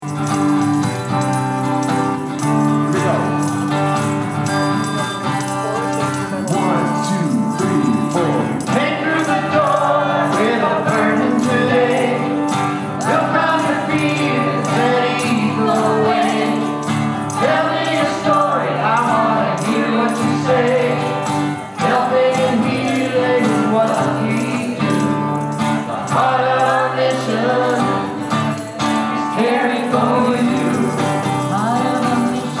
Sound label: conference song